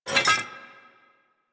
Investigate Putdown Metal; Warframe; Cephalon Weave

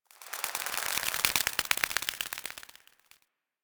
Forest Butterfly Flaps; Warframe; Grineer Forest

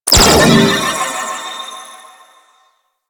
Primary Proj Attach, Weapons; Warframe; Cephalon

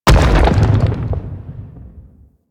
Moon Bolder Large Door Crack Impact; Ambience; Warframe; Orokin Moon

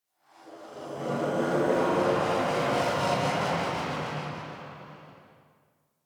Inhale Interior Small, Ambience

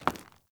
Foley Movement; Characters; Enemy; Mockeries

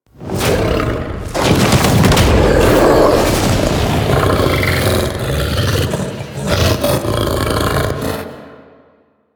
Finisher One Start; Warframe; The New War; Archon; Amar; Finishers

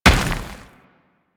Moa Step Dirt, footsteps; Enemies; Warframe

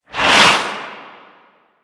Tank Missile Barrage Whizzby; Warframe; Lasrian; Abilities

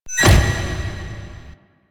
Stairs; Warframe; Entrati; Vaults

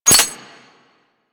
Kuva Hek Mech, weapons; Warframe

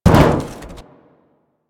Solid Metal, Physics Objects

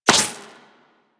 Laser Impact Default; Warframe; Weapons

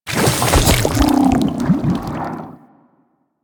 Burrow Goo; Warframe; Hunting Critters; Undazoa

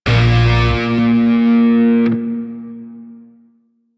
Shawzin Min Chord; Grineer; DE; Warframe

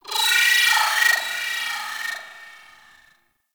Corpus Ship Robotic Random Calls; Warframe; Corpus Ship Remaster

